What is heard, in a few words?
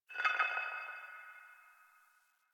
Exterior Man Hole Drag; Warframe; Ambience; Nineteen Ninety Nine